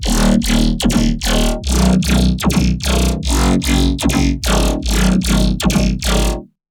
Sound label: Dirty Bass D# 150, Bass Hits; One-Shots; Nucleus; Ghosthack - Color Bass Essentials